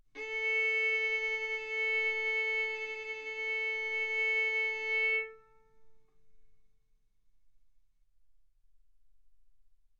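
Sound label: Violin arco mf A string A4 B4 stereo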